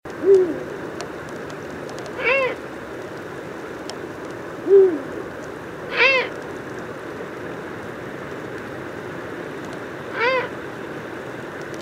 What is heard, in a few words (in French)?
Chant et cris hibou grand duc